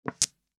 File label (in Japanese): 牌を置く; 場面切り替えや心理描写; 演出効果音